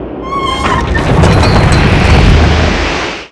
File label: Door Open; Seer; GamigoNA